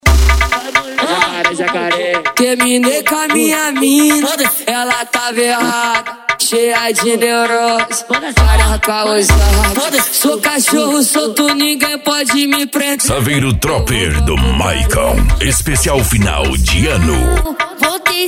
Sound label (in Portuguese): funk agressivo funk